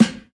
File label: subby snare sound a key, acoustic snare tuned to the A note. Loudest frequency: 706Hz; snare drum samples